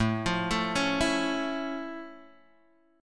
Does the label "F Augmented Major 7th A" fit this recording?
Yes